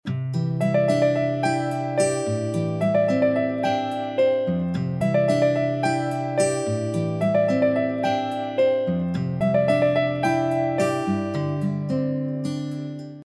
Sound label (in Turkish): santral fon muzikleri